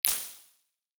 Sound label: simulated impulse responses; amt